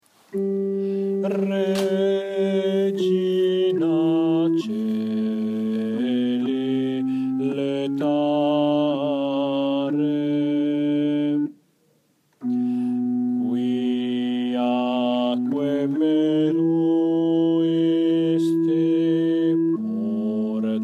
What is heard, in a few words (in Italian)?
bassi; regina coeli